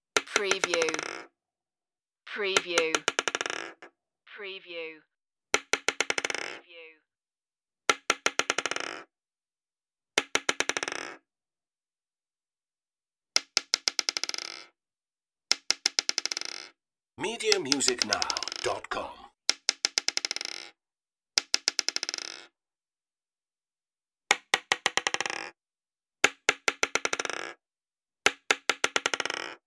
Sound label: marbles falling